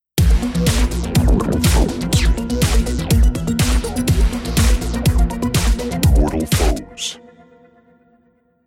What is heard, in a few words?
lost round